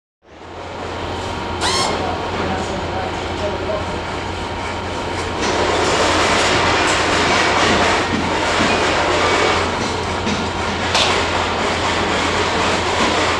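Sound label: Factory Assembly Shop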